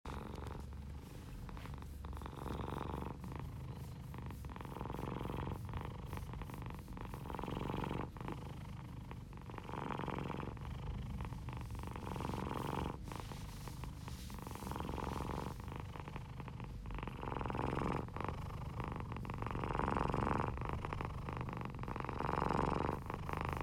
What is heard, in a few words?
Greece Greek cat Purrs; Giorgos; Ur-cat of Krioneri